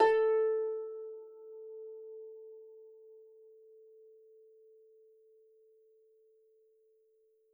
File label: A3 Harp